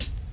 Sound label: KIT, Dance Mega Drums, HIP; Best Service